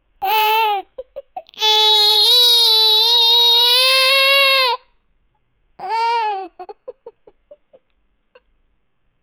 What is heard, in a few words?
child crying